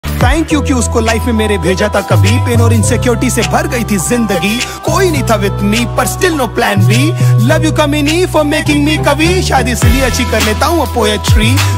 hindi rap ringtone

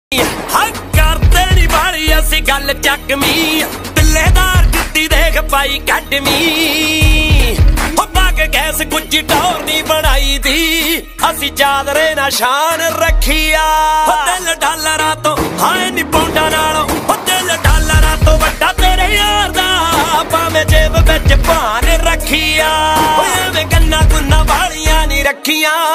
punjabi ringtone

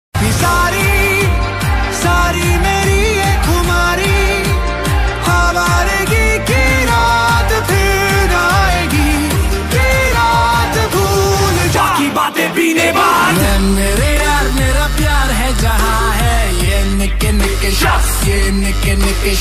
rap ringtone

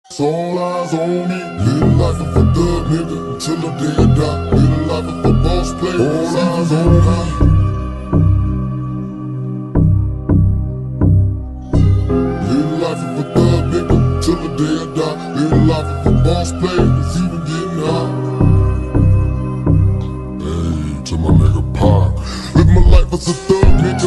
slowed reverb ringtone

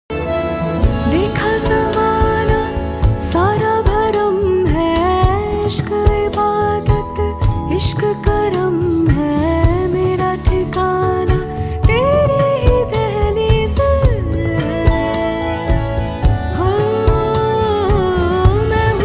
Female version

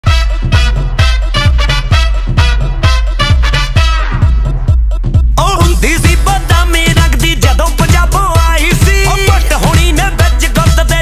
punjabi ringtone

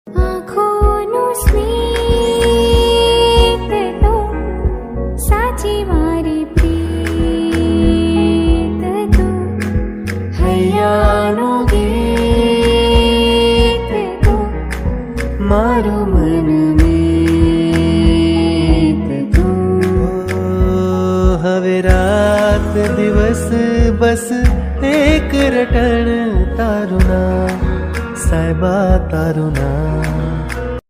gujarati ringtone

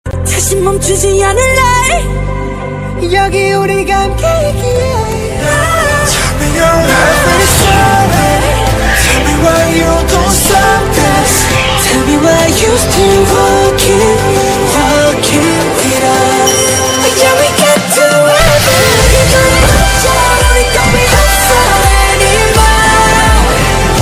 korean ringtone

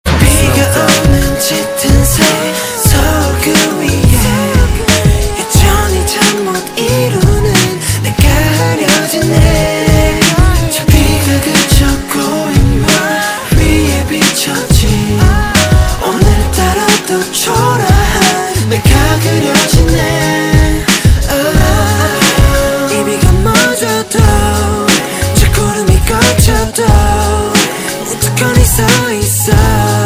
korean ringtone

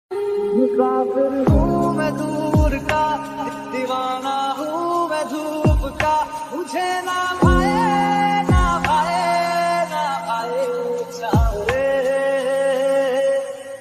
slowed hindi ringtone

